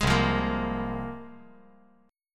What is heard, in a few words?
C 7th Suspended 4th Sharp 5th, C, down